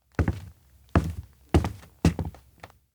steps indoor abandoned cafe walk close; anemoi

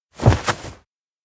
bump; awayuki; anemoi

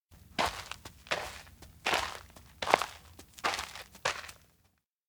steps outdoor walk trudge; jet; anemoi

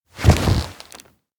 hug desperate; koyomi; anemoi パッケージ版 1.1

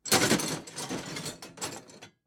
junk put; anemoi

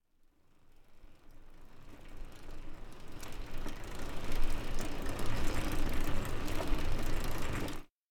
cycle approach; anemoi

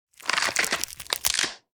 tree split; anemoi

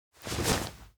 try undress; supika; anemoi

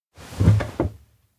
schoolchair sit; rikka; anemoi

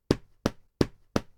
pat pat; spica; anemoi

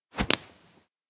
menko card throw; anemoi

